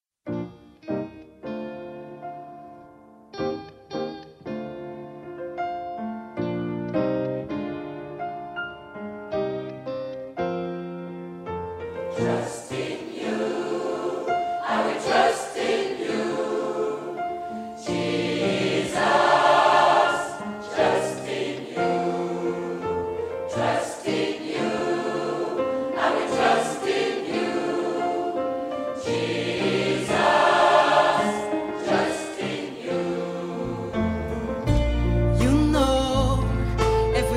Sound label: Trust in You, Gospel Choir; Rueil Malmaison; FRANCE